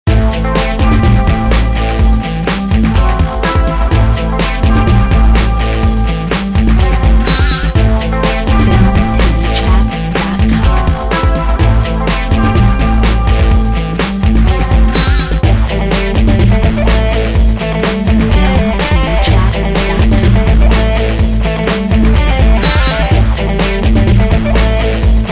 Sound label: Wild West guitar